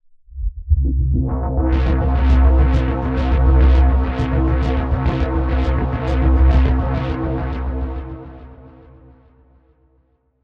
Mod Atmos, Dark Atmospheres; Zero-G Sound Sense Grime Scene

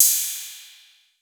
808, CYMBAL; CYMBAL P2

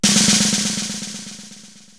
SD Orch. Roll, Korg TR-Rack drum machine

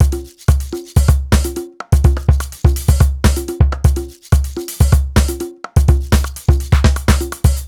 top full, 125 Beats

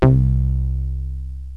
SOLID BASS; Kid Nepro; Elite

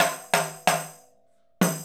Tambourine; Sonic Foundry (Sony Creative Software) - Essential Percussion

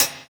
Closed Hat, JUNGLE BREAKS

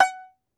Tenor Banjo, Plucked Strings

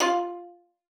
VIOLIN SPICC F4; Xsample VOL-5

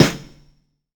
Snare reverb, drum hits; Zero-G; Soundclash

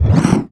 IMP WHOOSH

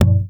WD L, DRM HITS; Spectrasonics; Liquid Grooves

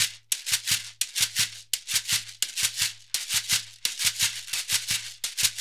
SHEKERE, Synclavier Percussion; ILIO

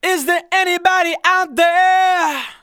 vocals sung F#; Techno Trance Essentials

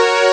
string c1; Amiga Soundtracker Sample Packs

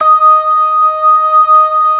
ORGAN LOWER MANUAL

